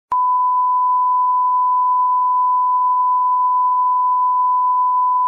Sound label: Blooper Beep Notification Tone